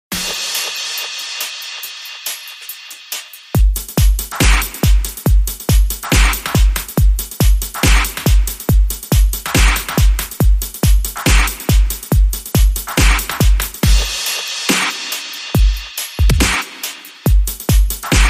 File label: Scripted Beat